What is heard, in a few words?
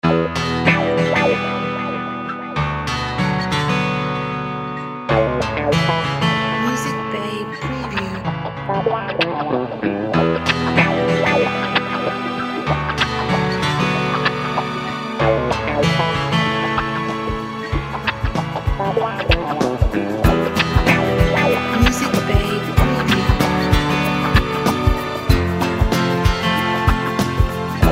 Hunky Funky